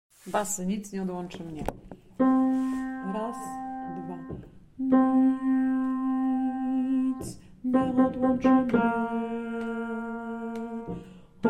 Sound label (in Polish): Bas